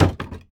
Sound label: wood door deploy; Rust